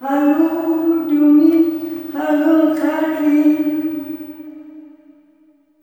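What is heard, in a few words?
LULLABYE, SOLO FEMALE; Spectrasonics; Heart of Africa 2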